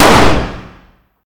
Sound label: fire dist 9x19 pistol int; gmod; arccw uc commons attachments